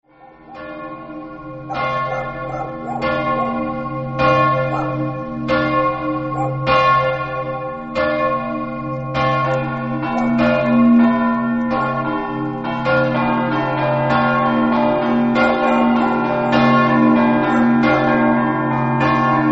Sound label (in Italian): campane; santandrea